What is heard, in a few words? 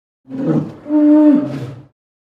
Bear, Polar Grunt, Breaths. Single Grunt With Inhale Breaths. Medium Perspective.; bears